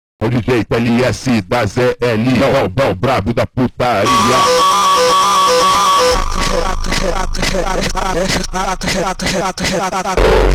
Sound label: Slowed